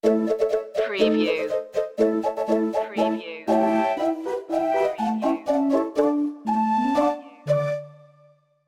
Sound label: roll up roll up stinger